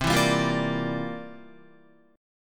C 11th C down Guitar; C11 strummed

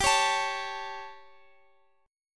G Suspended 2nd Flat 5th, Guitar; Gsus2b5 strummed; JGuitar

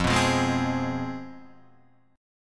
C Major 7th Suspended 4th Sharp 5th, guitar

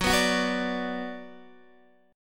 Gb Suspended 4th Sharp 5th, Guitar, Open D

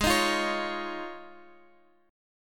D sharp 7th Suspended 2nd Suspended 4th G sharp

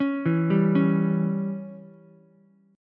C sharp Diminished C sharp, Ukulele; arpeggiated; chord; JGuitar

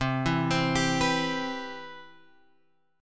C Major 7th, Guitar Open G

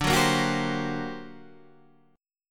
G Minor Major 11th, D

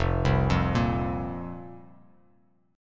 F Suspended 4th, chord; Fsus4 arpeggiated; JGuitar

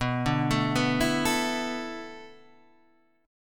B Minor 11th B down

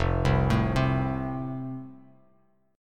F Major, Bass Standard; arpeggiated

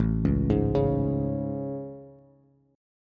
A Major 7th A, Bass; AM7; arpeggiated; JGuitar

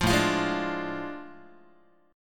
A Major 7th Suspended 4th Sharp 5th, down, Guitar; AM7sus4#5; strummed; JGuitar

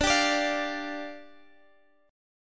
D Minor Double Flat 5th, down, Guitar, Standard; Dmbb5; strummed; JGuitar

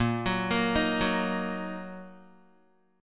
Bb Suspended 4th Sharp 5th Bb down Guitar; Bbsus4#5 arpeggiated

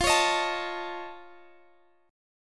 F Augmented 7th, chord; F+7 strummed; x,x,15,18,16,17; chord shape

